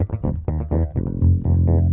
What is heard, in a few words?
Bb 120 Funk Rock